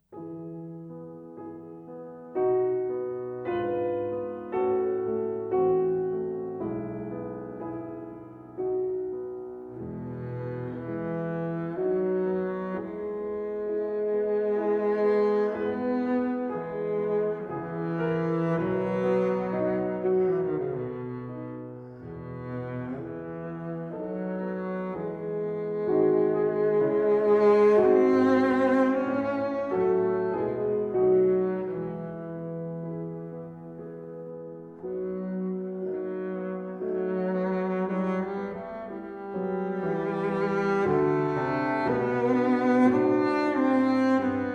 Arpeggione; Sonata in A minor, “Arpeggione” - Adagio

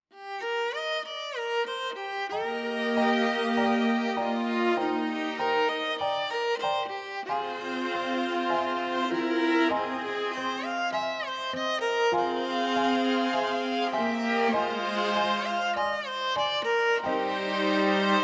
Cheerful VARIATION STRINGS

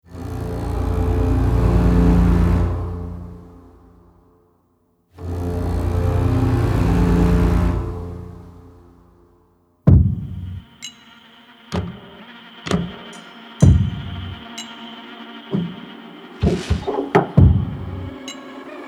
Horror dark march

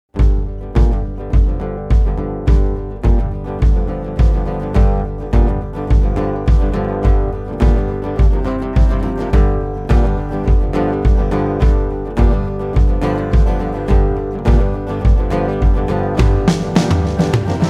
Blues

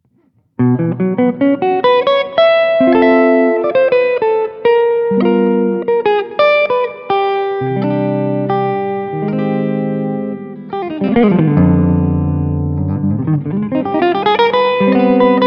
Jazz Solo Guitar; Session Guitarist

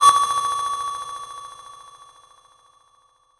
Ping High, Flickering

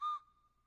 WOODEN WHISTLE

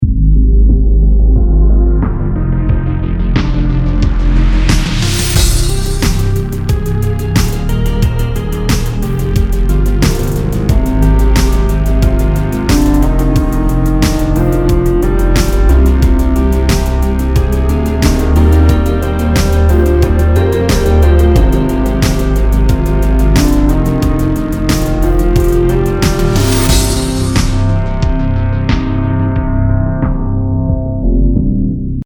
Loop

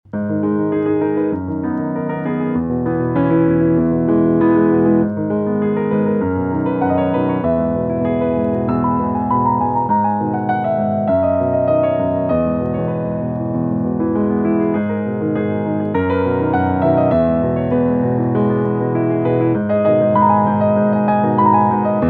micropiano

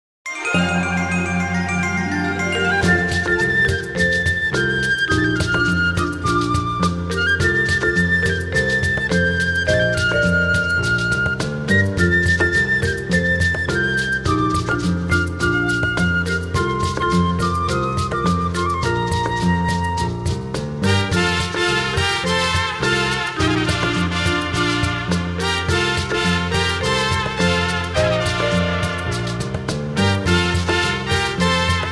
Piccolo

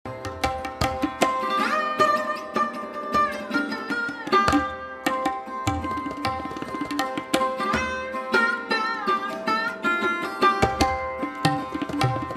Tabla Sarod Duet

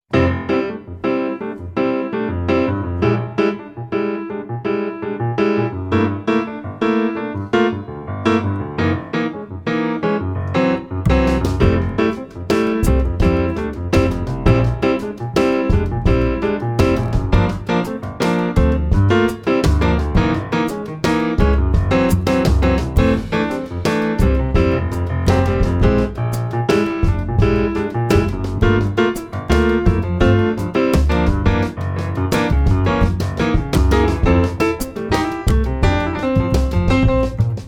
Interlude

